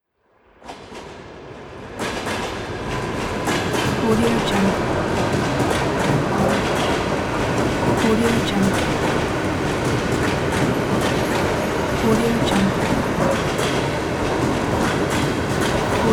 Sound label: train in the city